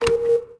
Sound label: button hightech; interface